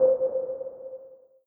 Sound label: beap reverb